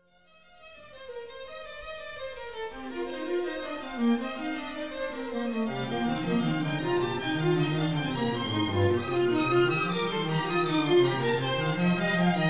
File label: orchestral